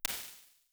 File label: Blatty Plate, impulses